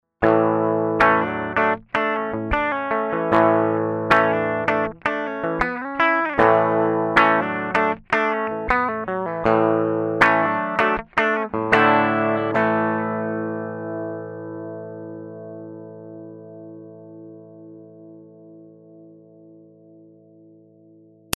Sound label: Rhythm crunch